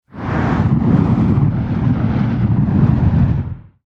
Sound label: Fire Fireball Wave; Flames; Objects